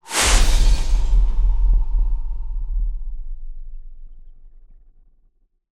Music Stingers Stinger Death (central & LFE channels)